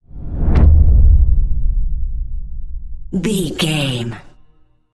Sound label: cinematic deep low whoosh impact